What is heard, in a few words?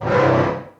Synth